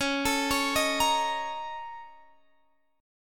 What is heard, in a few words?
A sharp Minor Major 7th Double Flat 5th